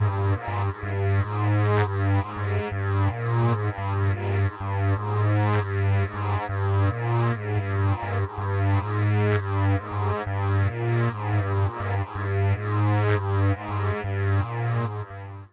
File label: Bass Synth No Sub Rhythm